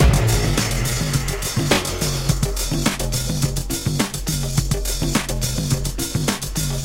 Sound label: groove, basse; cubase